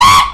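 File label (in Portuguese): buzina da copa, horn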